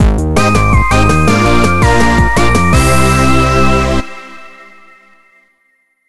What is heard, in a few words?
Correct; Puzzle Series Vol. 12 Akari